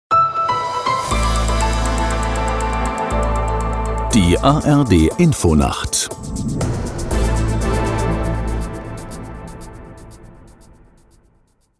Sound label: News Opener; ARD Infonacht